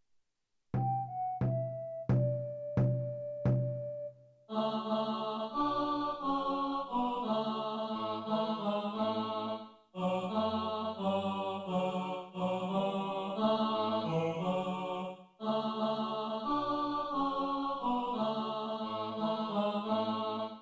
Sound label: ein feste burg tenor, choir